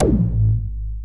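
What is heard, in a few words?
percussion, Analog Drums; Roland - Rhythm Section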